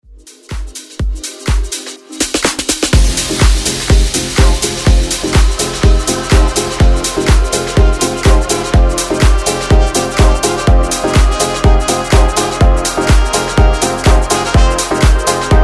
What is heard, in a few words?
Pop Dance Redrum